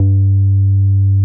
MOOG LOW G2, ANALOG BASS; Music Store - Claudius Diemeter Schwerlos

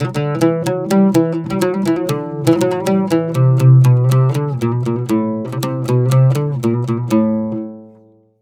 120 oud

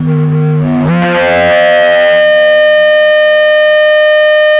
RADIO FX, Hard & Loud Techno; USB Soundscan; AKAI

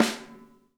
SNARES, Drum Kits

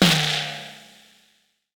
FX TOM, Drums Acoustic; FX KIT; USB Soundscan vol.10; AKAI; 90s sample CD

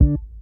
IN HOUSE BASS; Sampleheads; Ultra Freakin' Bass

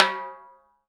TIMBALE HI MF, PERCUSSION; Sonic Reality; Interactive Drum Kits

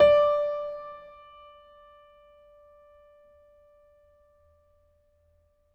MIX L, Acoustic Piano, PIANO STEREO; InVision Interactive; Miroslav Vitous

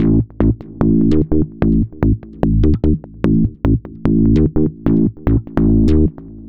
Synth Bass

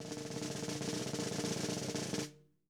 roll, drum

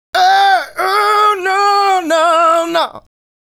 Male Solo, Vocal; Sonic Foundry (Sony Creative Software); Vocal Toolbox

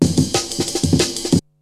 Beats, Breakbeats, Techno; Syntec; Wall of Sounds VOL-2; TECHNO-BOX; 90s sample CD

